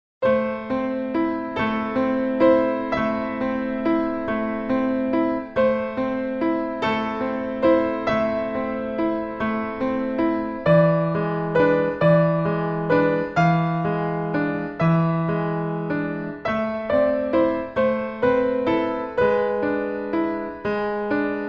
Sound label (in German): Klavierstueck Amoll